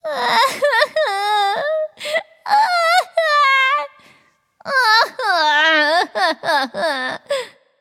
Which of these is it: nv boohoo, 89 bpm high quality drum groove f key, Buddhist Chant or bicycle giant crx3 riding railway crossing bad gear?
nv boohoo